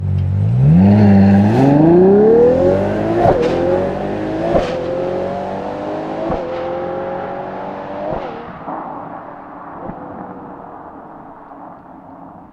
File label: Ferrari 12 Cilindri Engine Rev